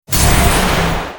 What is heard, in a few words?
coldsnap effect; abilities